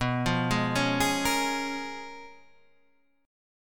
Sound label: Db 13th B, guitar